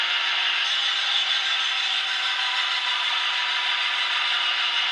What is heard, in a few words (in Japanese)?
kinzoku none